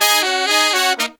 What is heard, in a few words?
Pop & Funk Brass, 120; Best Service ProSamples vol.25; AKAI